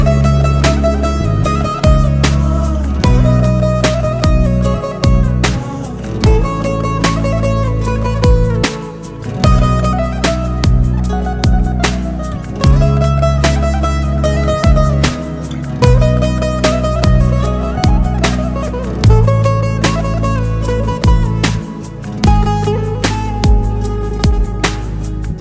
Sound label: Ringtone Mobile